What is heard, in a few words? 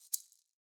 maracas Single Hit Both Hands; Raw Perc One Shots; Slate; SD SEISMIC Drum Sample Pack